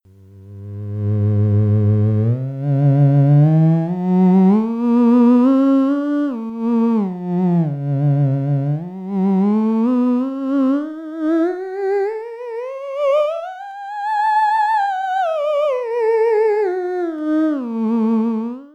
ss voice, theremin

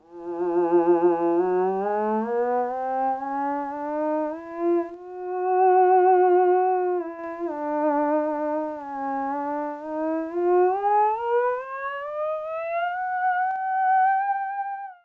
ews resonator, theremin

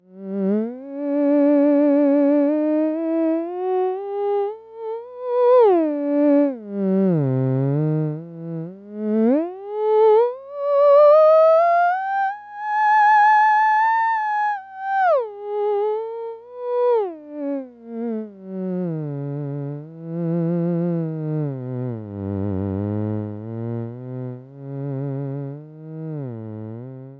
cello sound, theremin

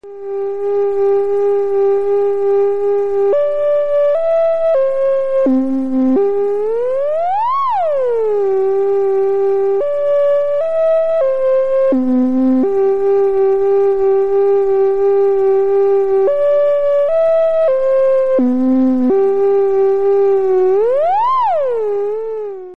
close encounter, theremin

